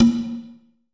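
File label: FX BONGO LOP; AKAI S-Series CD-ROM Sound Library VOL-5; FX PERC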